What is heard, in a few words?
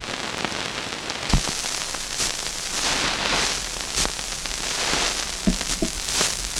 Vinyl Old 78, Noise; layering